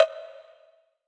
Woodblock; MARKYDRUMS

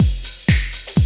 loop, Roland; Sound & Vision - Gigapack I

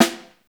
SN2PP, orchestra hits; Best Service; Peter Siedlaczek's Advanced Orchestra

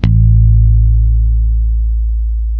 A 2, ultra pick, bass; Spectrasonics; Bass Legends CD 1

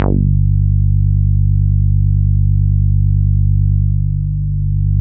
bass, synths

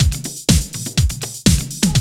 loop, 125; Sound & Vision - Gigapack I

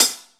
closed, Drums Percussion; Zero-G; Total Drum Bass

